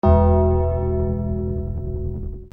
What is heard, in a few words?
dong